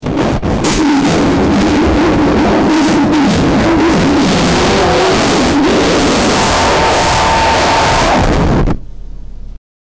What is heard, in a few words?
hardworking team, work